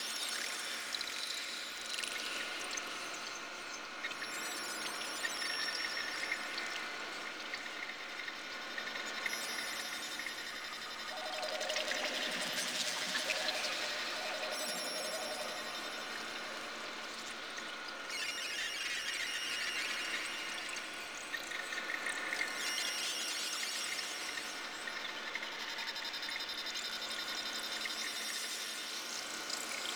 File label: Messenger Ambient Loop; Warframe; Ambience; Nineteen Ninety Nine